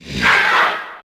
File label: drake vox